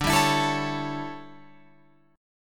D Major 7th Suspended 2nd Suspended 4th, D, down, Guitar Open F; strummed